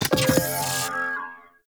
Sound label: Hack UI Positive; Warframe; The New War; Narmer Hack